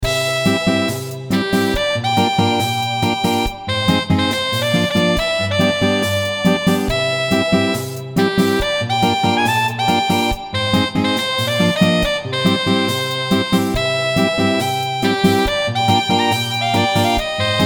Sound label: reggae